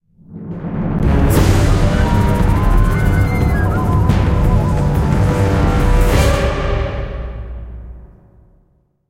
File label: Terror Sting; Jurassic Park The Game